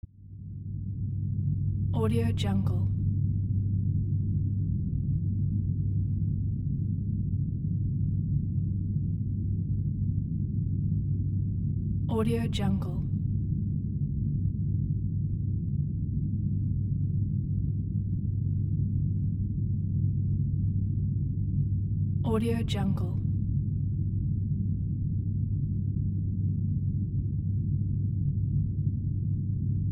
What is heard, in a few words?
horror background noise